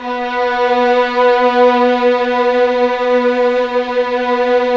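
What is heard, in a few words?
violin ensemble; 90s Sample CDs; Club-50 - Foundations Roland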